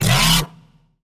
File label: Bass - Dark Starter, Serum 2; Routed Sound; Serum 2 Expansion - Chaos Bringer Vol.1